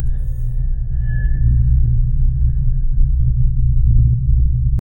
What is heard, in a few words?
rapidly escalating franti